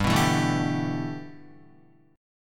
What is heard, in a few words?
G 6th Add 9th G Guitar, chord; G6add9 strummed